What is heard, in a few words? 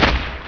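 grunt machine gun; hgrunt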